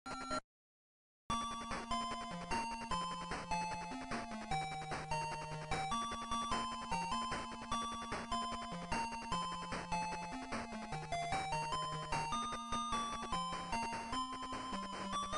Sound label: Title Theme old school; Phantasy Star; SMS